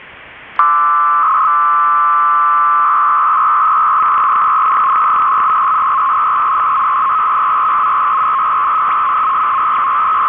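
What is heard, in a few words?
BELL 103 LONG, Algerian Air Force FSK modem; Station sending long data burst with 300Bd; FSK-systems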